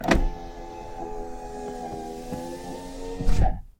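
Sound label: close power window; car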